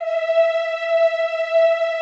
EEH E4, Classical Choir; Best Service; Extended Classical Choir

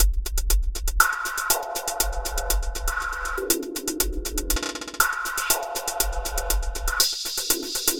Hi-Hat Esoterica, Beats; Sonic Foundry (Sony Creative Software)

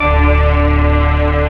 SYN TV, Dance Synth; Sound & Vision - Gigapack I CD 2 (Roland)

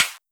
Hats, Elektron MachineDrum

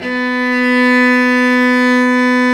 STR Vc Arco p

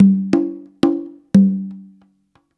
CONGA, Modern Percussion Loops

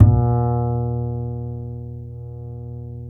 B2, DOUBLBASS, Balkanish Traditions; USB Soundscan vol.63; AKAI

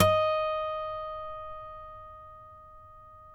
HARM D#5, Guitars; Spectrasonics; Hans Zimmer Guitars VOL-2; FNGSTYLE PFM

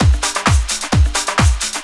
drum 130 tribal, drumloops

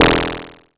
BRIGHT, PERC HITS; Zero-G Ambient 1

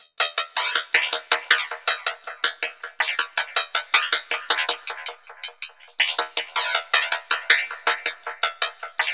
ROBOHB, JUNGLE ROBOT; Zero G; Malice In Wonderland

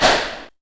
SHOT TUNNEL; 3D Sound - 3D Keys VOL-1; MEAN 3D

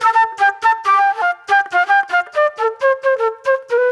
Jammy Flute

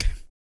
REGGAE, Vocal; ILIO; Vocal Planet; Blues & Soul

